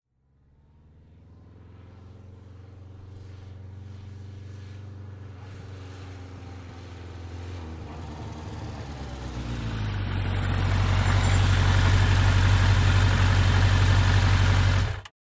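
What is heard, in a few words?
Motorcycle, Kawasaki 750, In Slow, Stop Close Up, Idle, Off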